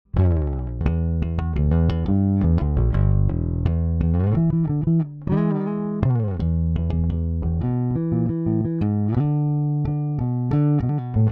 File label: Preci Finger